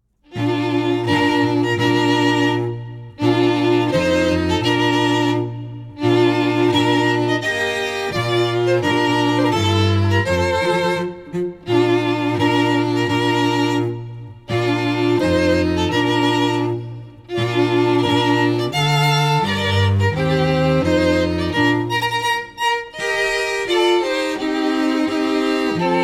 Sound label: Bridal Chorus, strings